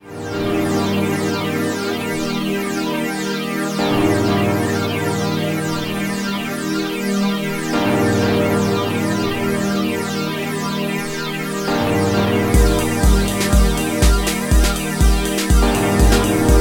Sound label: rhythm